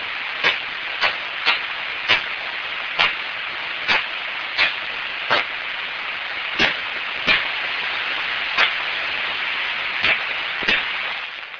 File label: pipipi; cris sociaux d'une pipistrelle commune; Pettersson D200 réglé sur 20 kHz; Hamois, Belgique